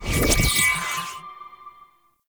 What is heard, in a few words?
Mirror Portal Beacon Stinger; Warframe; Duviri Murmur